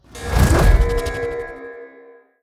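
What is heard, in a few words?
shield fire layer; apocalypse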